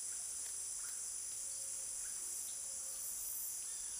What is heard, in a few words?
jungle insect loop; Source Engine; tf; lair